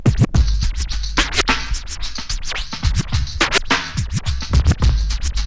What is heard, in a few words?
SCRATCH STUTTER LOOPS; Megabass Remix! 2